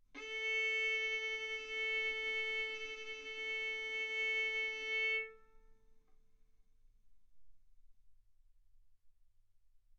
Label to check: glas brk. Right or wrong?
Wrong